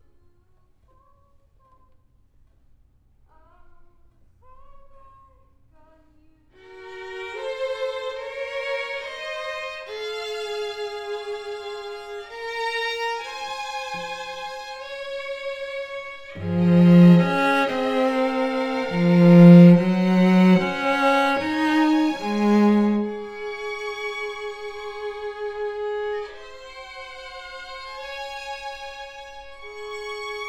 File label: Cello